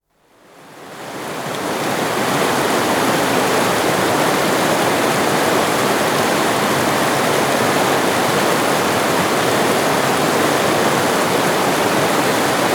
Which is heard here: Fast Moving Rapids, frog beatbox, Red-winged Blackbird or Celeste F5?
Fast Moving Rapids